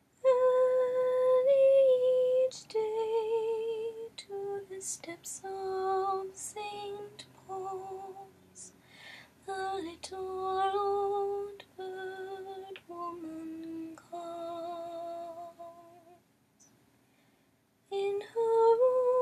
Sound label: lullaby